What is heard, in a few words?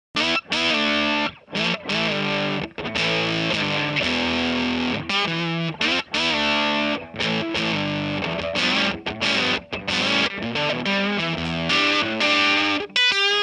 bass boost on; DOD; before 12.h after 12.h